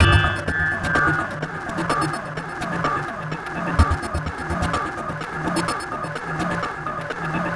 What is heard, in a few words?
rhythm effect, Drum N Bass; Sonic Foundry (Sony Creative Software); Knutrix Drum & Bass